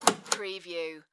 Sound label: Light Pull Chain On; Sound Effects Bible